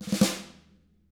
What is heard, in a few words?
snare ruff; ILIO; Double Platinum Drums 1; SNARE ROLLS